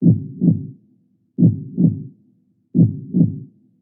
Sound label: Free Heartbeat Sound Effect; sfxsource